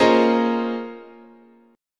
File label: Asharp Minor 11th, chord, guitar